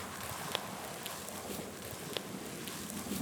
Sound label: sand sinking